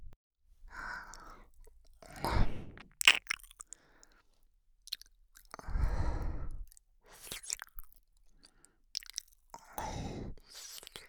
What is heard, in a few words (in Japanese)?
おだやか耳舐め, ASMR